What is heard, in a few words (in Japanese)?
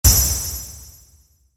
ガチャ インパクト